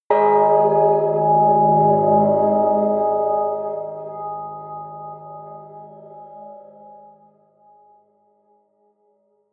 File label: melodic odd sweet bell; flaechen